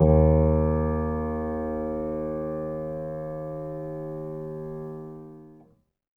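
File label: Acoustic Piano, Left; InVision Interactive; Miroslav Vitous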